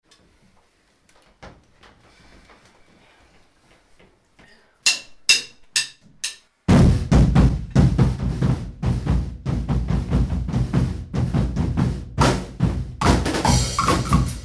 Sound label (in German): Rythmus